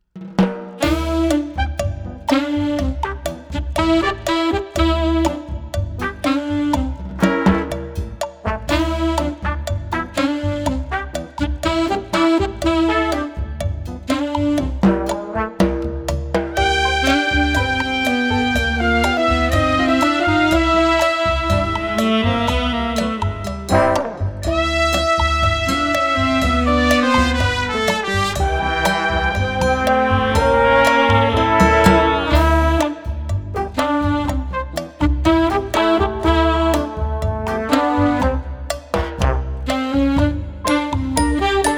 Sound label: Cha Cha Cha